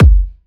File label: 128BPM Kick; Vengeance Samples; Kinto Kit